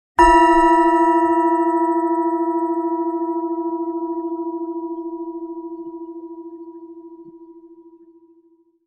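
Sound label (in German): Planetenschale inkl. Klöppel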